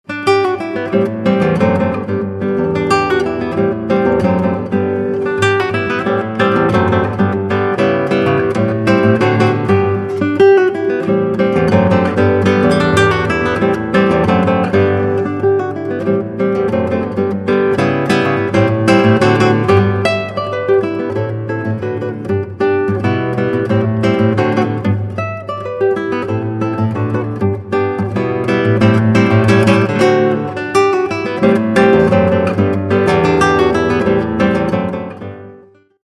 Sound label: bossa nova